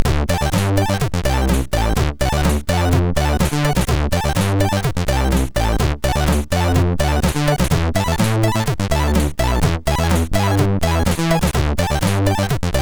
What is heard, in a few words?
Intro jam, MOS6581R3